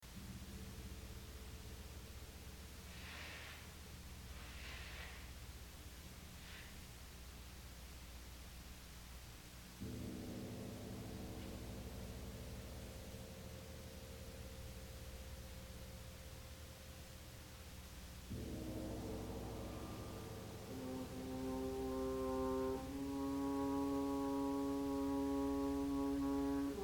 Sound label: Suite for Brass and Percussion; Movement 2; Thoughtful